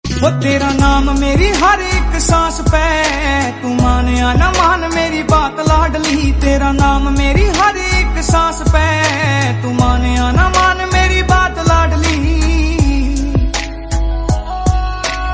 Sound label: Love Song Ringtone